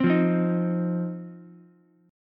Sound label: C Minor Double Flat 5th C down Ukulele; Cmbb5 strummed; JGuitar